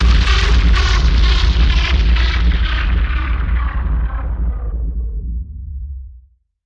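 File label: Bend Pitch Shifter Fast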